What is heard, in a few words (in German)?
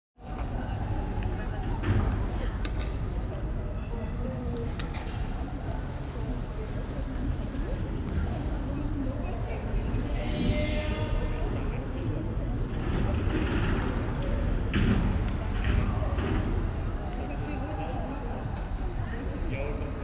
Sopran im Innenhof, a soprano from the theater can be heard, her voice gaining presence through the reflections and bringing a musical liveliness to the courtyard; Soundwalk; A Soundwalk for Klangspuren Festival 2025